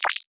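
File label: Computer Vocal BOOM ONE; B00M ONE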